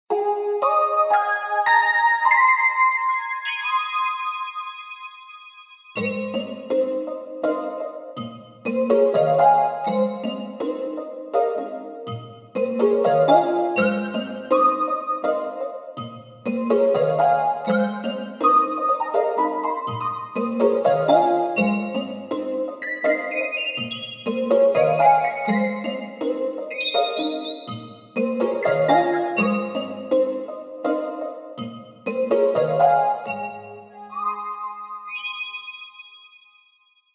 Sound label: platform melody